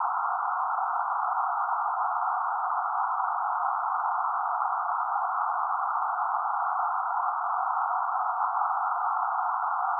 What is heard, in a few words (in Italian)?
Rosa filtrato 1kHz